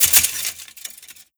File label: chainlink grab; scripted sequences; reviver; room escape